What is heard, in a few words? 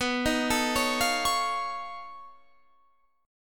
B 9th Flat 5th B down Guitar Standard; B9b5 arpeggiated; JGuitar